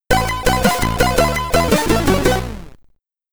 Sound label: Bonus End, SID Stereo; smash tv commodore 64